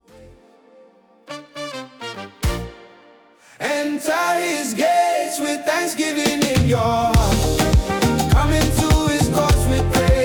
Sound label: Thanksgiving Afrobeats; Psalm 100